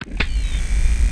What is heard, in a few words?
trap cock, weapons; xatrix